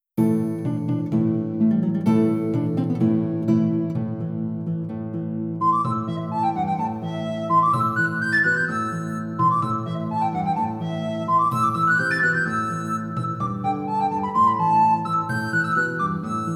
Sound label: Tavern; Kingdom Come Deliverance; cutscene music